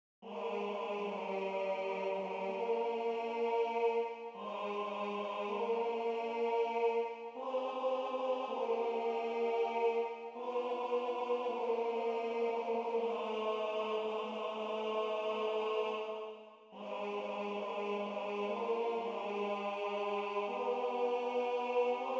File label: Tenor